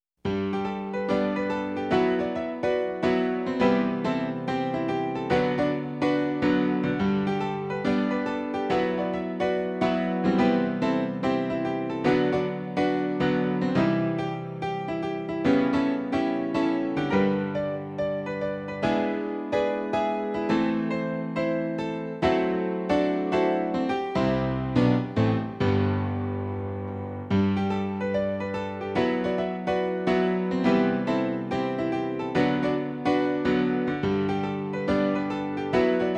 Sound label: PIANO ONLY